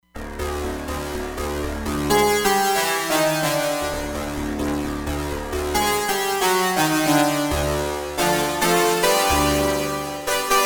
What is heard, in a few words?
Dirty Combo, Roland Fantom XR